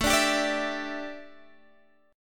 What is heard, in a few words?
A 7th Suspended 4th Guitar Standard, chord; x,12,12,12,15,12; A7sus4 strummed; capo=3